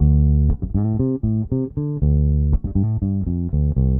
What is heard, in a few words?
Bass, 70s Analog Funk